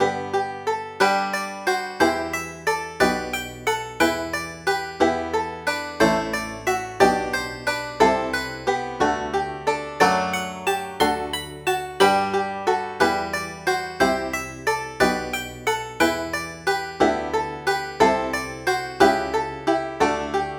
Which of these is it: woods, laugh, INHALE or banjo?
banjo